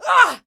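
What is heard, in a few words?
inmate female exertion; Prison Architect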